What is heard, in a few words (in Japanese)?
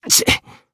Voice damaged jp; hardy